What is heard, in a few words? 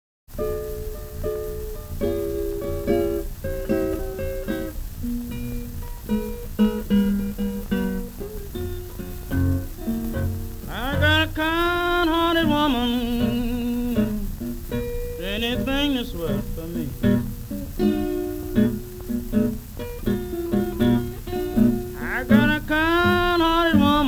Blues